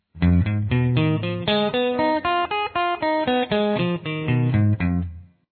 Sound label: Arpeggio Gm style, guitar